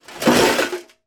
foley glass broken in metal tin quick short roll tip